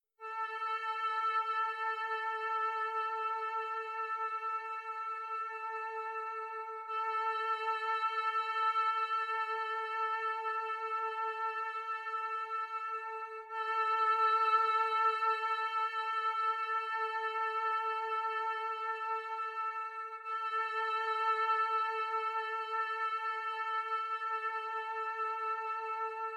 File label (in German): stimmton 442Hz